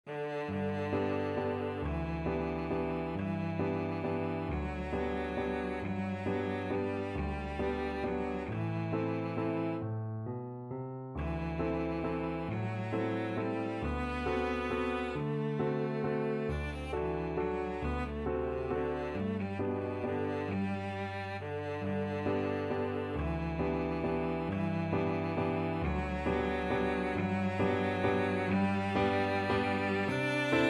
vals, cello

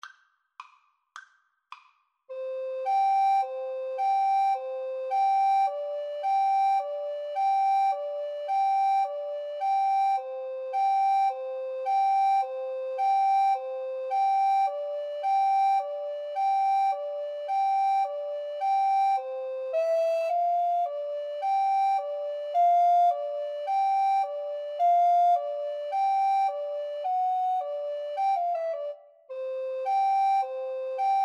mexican hat dance, Recorder Duet

